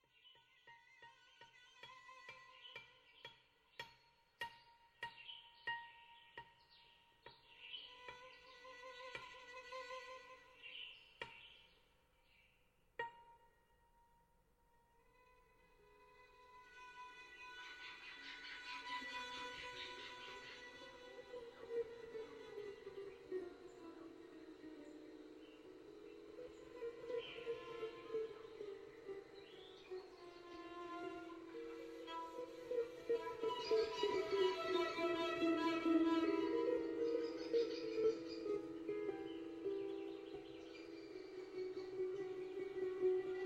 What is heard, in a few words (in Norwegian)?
Soundscape improvisasjon